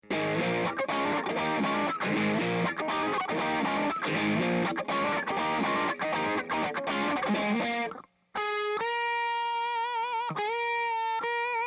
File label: Red Fang Guitar Speaker Overdrive; Eminence